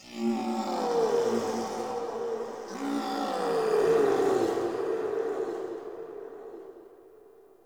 Ext Chatter Distant Growl Moan; Ambience; Misc Outdoor; Warframe; Infested Eris

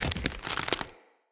Mockery Collapse; Enemy; Foley; Mockeries; Characters

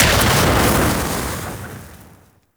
Eruption Fire Burst; Warframe; Sargas Ruk

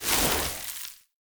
Hoverboard Terrain Caster Snow

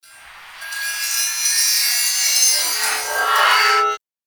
Backstab Blades Hover Circle; Warframe; PaxDuviricus

